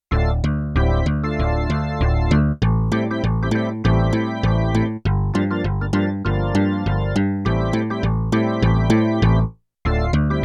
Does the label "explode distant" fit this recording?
No